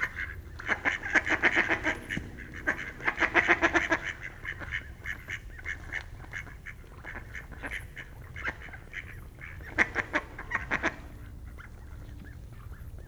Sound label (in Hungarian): vízen, tőkés réce; termeszetben; allathangok